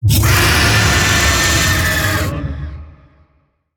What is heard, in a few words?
Vocal Attack; Warframe; Zariman; Void Angel